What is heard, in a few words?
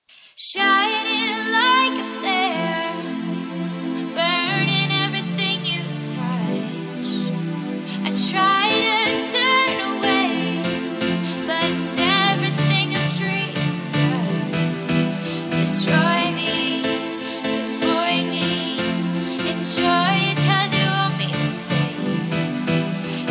disco music